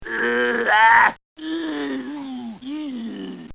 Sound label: Horse Grunt; Horses; Animals; Bobby Cole